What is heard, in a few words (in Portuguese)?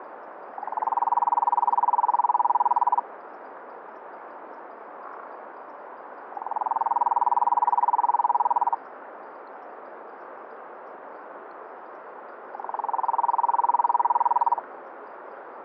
Rhinella crucifer canto anuncio